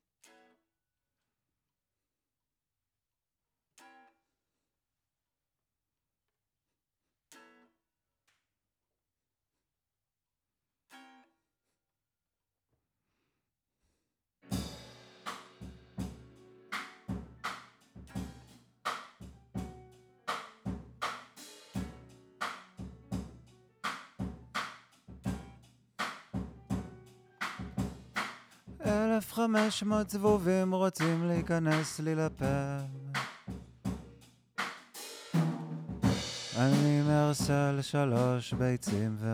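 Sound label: Vocal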